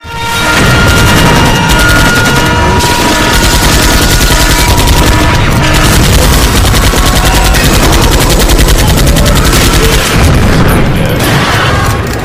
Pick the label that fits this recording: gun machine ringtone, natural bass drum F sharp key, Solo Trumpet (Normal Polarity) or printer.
gun machine ringtone